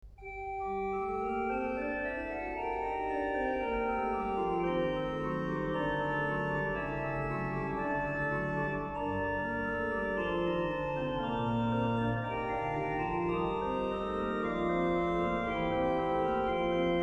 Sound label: variatio iv, Great Organ Works; Bach; Great Organ Works 3